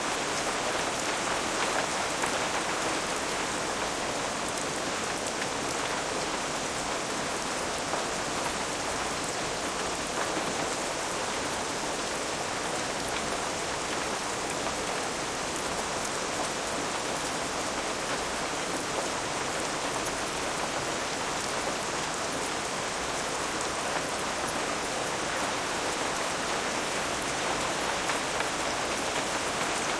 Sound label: Rain Medium Loop